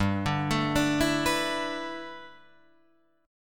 G 6th Add 9th G Guitar Drop D, chord

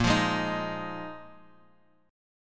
Bb 7th Suspended 4th Bb 4G,5C,5E,5A

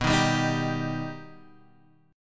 B Augmented B Guitar Half Step Down, chord

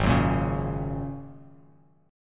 Ab 9th Suspended 4th Ab down Bass Standard; Ab9sus4 strummed; JGuitar

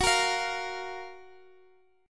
F sharp Major 7th, Guitar Drop D; chordshape; down

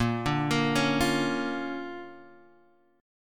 D Minor Major 7th Sharp 5th, A sharp

